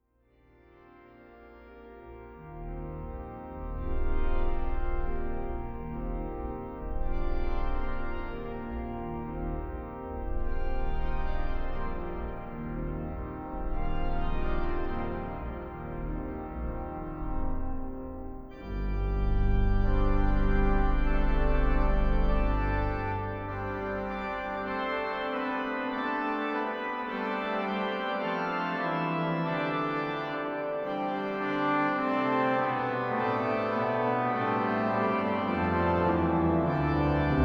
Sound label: Organ